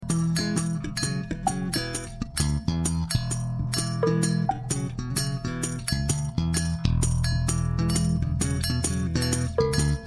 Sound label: loop